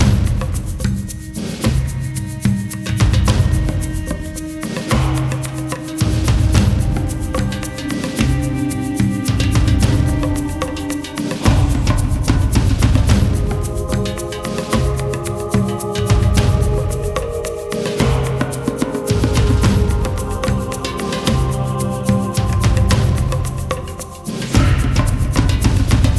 Garden Main LOOP

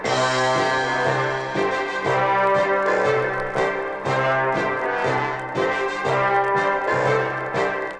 Newsreel Vinyl Loop 4 bar 120 bpm

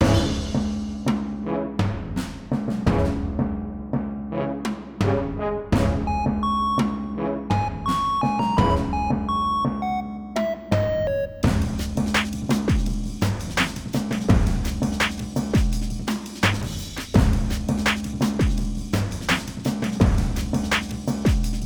Town Music Loop

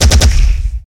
eartshatter ability; Firestone Idle RPG

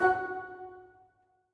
Goblin Rise; King's Quest Chapter 1 A Knight to Remember

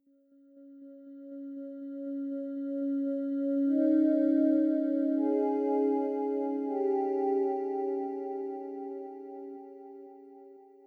Jingle Loading; X-Scape; 3D Space Tank; DSiWare; Nintendo DS

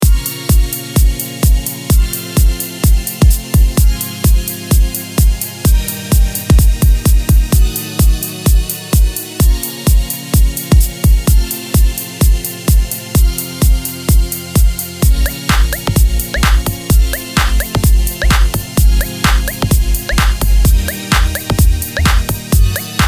Loop - Settings Screen; Breakforcist Battle

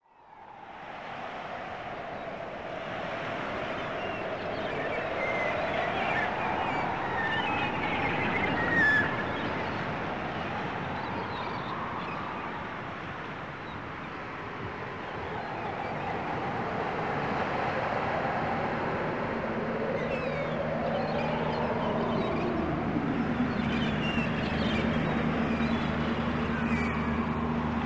artic ambience; Worms 3D

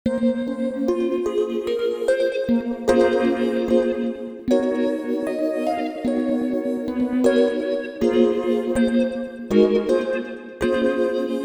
Chords

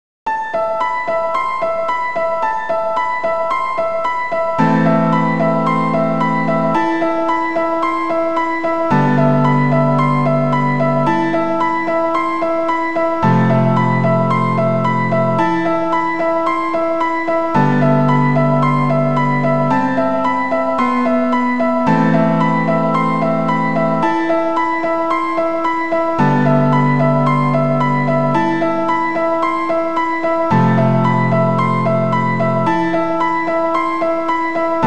Unused Game Tune; Langrisser Milennium

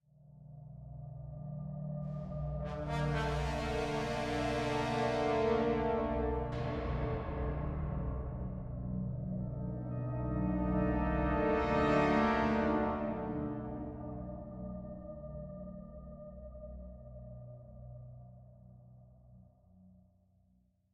Spooky Ship Mood

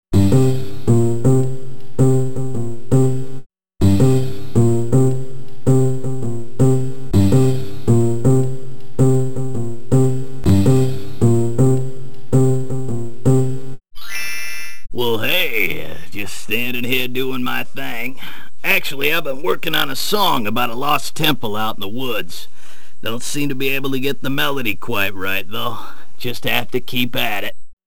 Guitar Player; beyond shadowgate; Icom

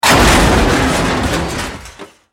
Enemy Artillery Death; Godzilla Smash3

